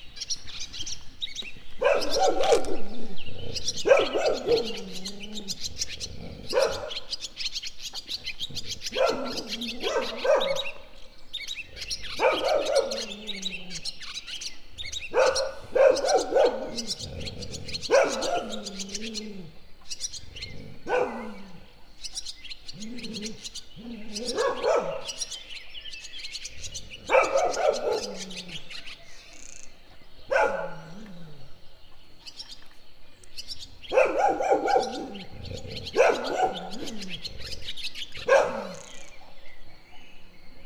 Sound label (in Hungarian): ugato kutya is, parti fecske; allathangok; termeszetben; egerszog